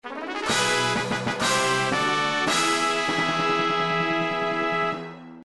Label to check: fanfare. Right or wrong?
Right